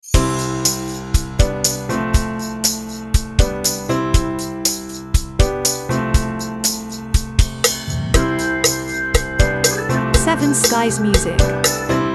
Light Comedy

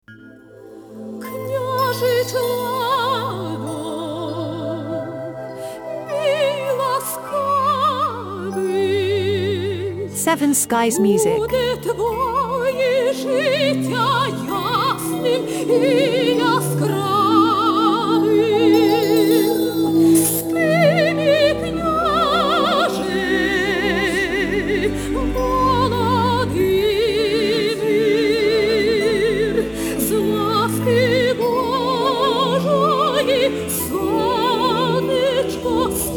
Lullaby

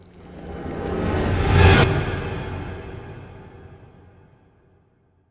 Scary sting

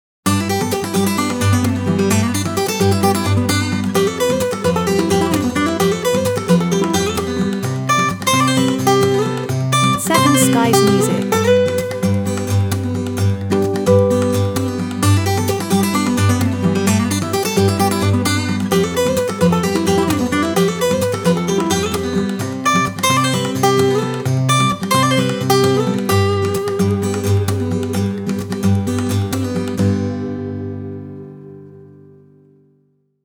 Happy Guitars

